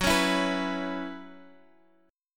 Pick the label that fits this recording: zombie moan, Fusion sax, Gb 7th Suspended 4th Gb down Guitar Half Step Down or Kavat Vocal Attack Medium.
Gb 7th Suspended 4th Gb down Guitar Half Step Down